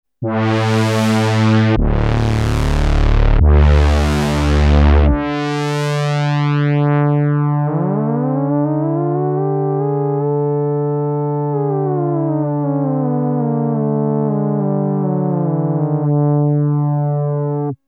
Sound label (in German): fett